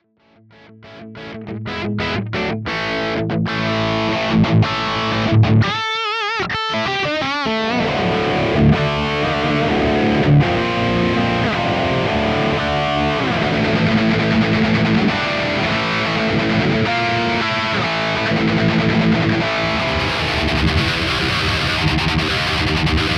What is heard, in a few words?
Boost pack